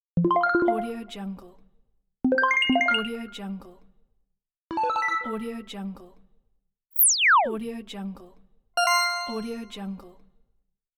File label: interface sounds